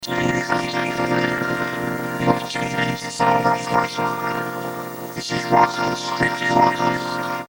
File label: vocoder